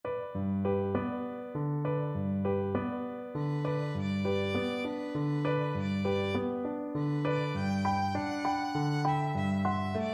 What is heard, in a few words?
lullaby, violin; brahms